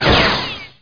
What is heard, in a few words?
ricochet; PC Gamer IT CD 30